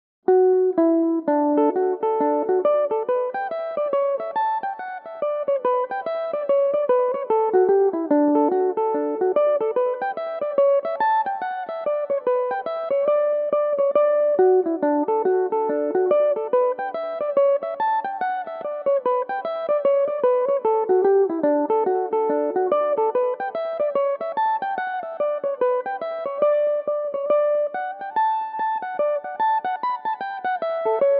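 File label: D major, Irish mandolin; The Tailor's Twist; Belmuse EM-190 electric mandolin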